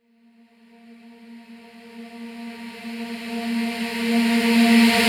Reverse Vocals A#; Vengeance - Essential Clubsounds Vol.4; Effects

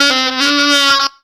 orrible sax; Zero-G; Funky Elements

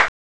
oink; ST-XX Amiga Soundtracker Sample Packs

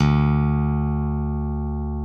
D1 LONG, STIK BASS; D-STIK BASS2

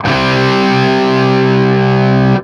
5TH C, Guitar; East West; Steve Stevens Guitar Samples Collection

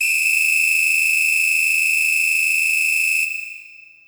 B Samba Whistle H, Yamaha PSR E305

